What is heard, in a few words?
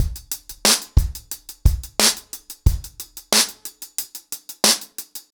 ACOUSTIC DRUMS, 90 BPM